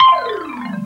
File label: Gliss Gown (one shot C); Sound FX